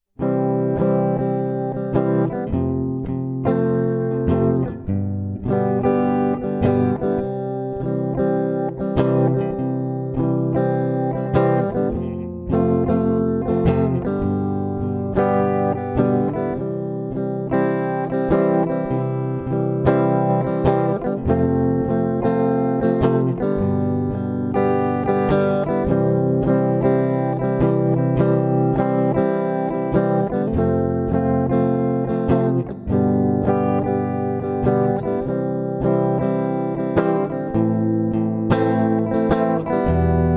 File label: backup song